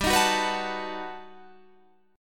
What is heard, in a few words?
G Augmented Major 9th